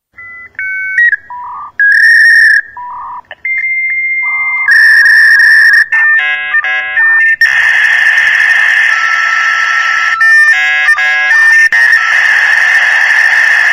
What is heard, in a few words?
Reception Modem